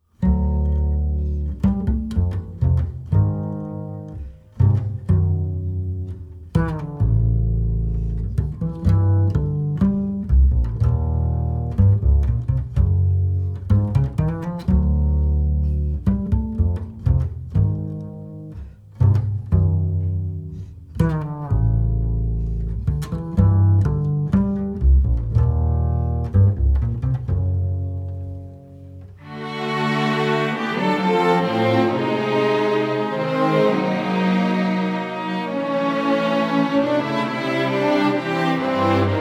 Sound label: sketches for bass orchestra